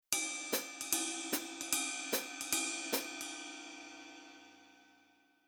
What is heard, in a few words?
Rhythm Basic Velo HH Ride